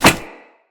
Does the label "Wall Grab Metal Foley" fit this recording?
Yes